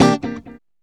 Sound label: geetar